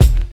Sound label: 2000s present kick sample g key, Royality free bass drum single shot tuned to the G note